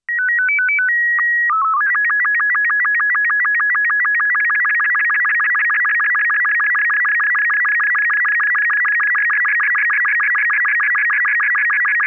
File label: SSTV ROBOT36; ham